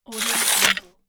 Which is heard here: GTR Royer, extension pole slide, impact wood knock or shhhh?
extension pole slide